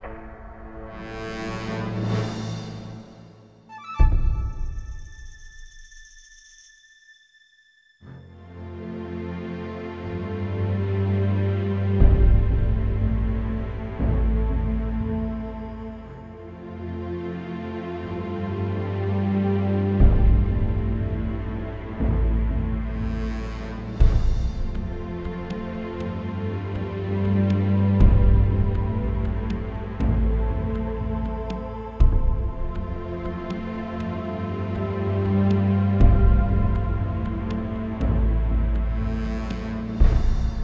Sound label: Menu Soundtrack